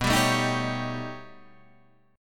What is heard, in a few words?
B Augmented 9th B, chord